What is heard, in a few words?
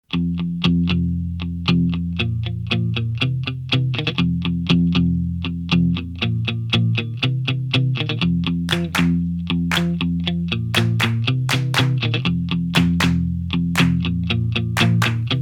music